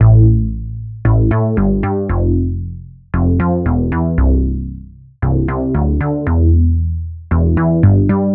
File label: A Bass Modern Run, 115; Zero-G; Future Wave